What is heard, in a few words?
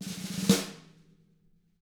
snare roll